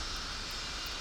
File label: Background Noise